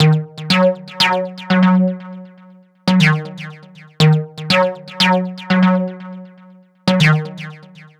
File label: Techno Synth; Sonic Foundry (Sony Creative Software); Trance NRG VOL-2